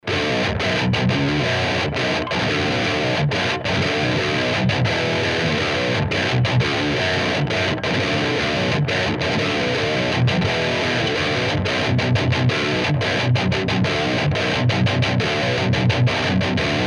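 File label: EMG LTD distortion, 7string; EMG LTD przester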